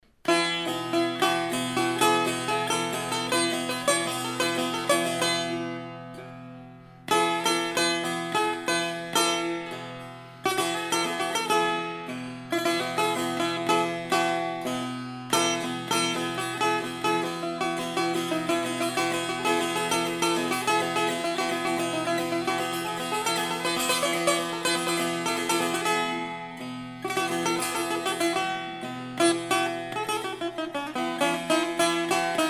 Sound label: persian setar; small size bowl